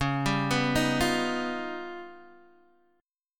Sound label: C sharp 9th Flat 5th C sharp down Guitar DADGAD, chord